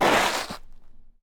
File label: sliding; anemoi